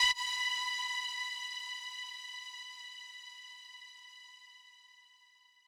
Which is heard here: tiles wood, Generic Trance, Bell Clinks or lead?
lead